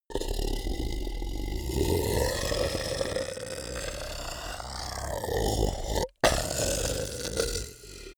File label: beast grunt warning angry; kreaturen; comedy-cartoon; soundpool